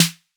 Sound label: 808 snare; SNARES; Wizoo - Platinum 24 Electronic